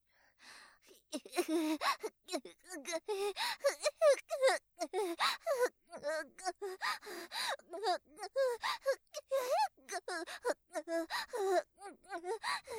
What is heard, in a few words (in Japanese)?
苦痛 ボイス